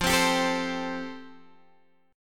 F Major Add 9th, Guitar, Open G

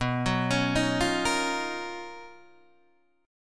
B Major Sharp 11th, Guitar, DADGAD

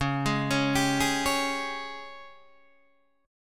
G sharp Major 7th Suspended 4th, C sharp

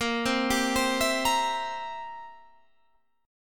C 7th A sharp

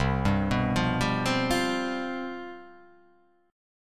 C Major 7th Suspended 4th

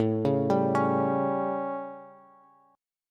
A 7th Bass, chord; arpeggiated; JGuitar

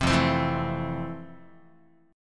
F Major 7th F, Guitar; strummed; JGuitar

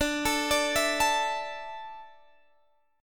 D Suspended 2nd D, down, Guitar Open D

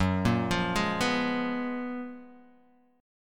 Gb Major 11th; GbM11 arpeggiated; JGuitar

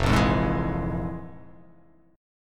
F Minor Major 7th Double Flat 5th, chord

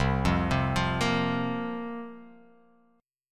C 7th Suspended 4th, guitar

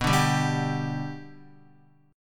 B-Minor Sharp 5th, Guitar; strummed; JGuitar

